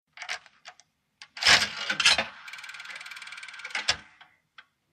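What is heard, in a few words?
British Std Call Box 3 Pen, coins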